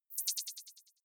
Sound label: click decay, signale